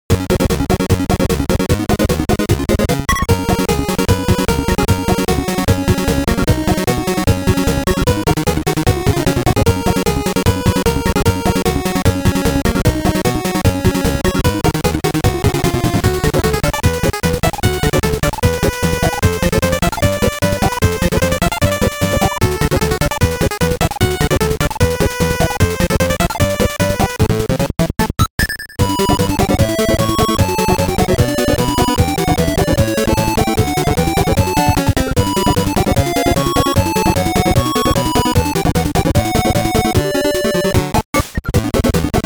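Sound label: FM synth music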